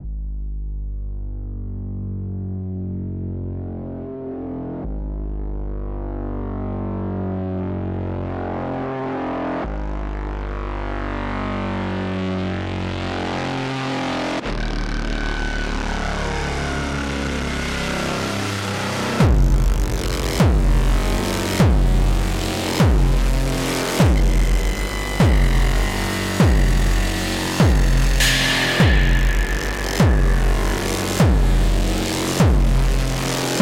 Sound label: Industrial Techno